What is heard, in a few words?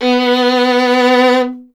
STR VLN, Vln Detache; Miroslav Vitous